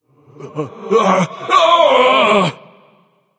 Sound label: fear; smite; sunwukong